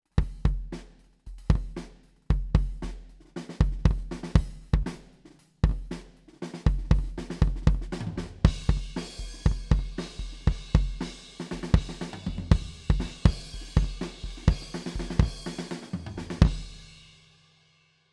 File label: kick head off; U47; mid; 1ft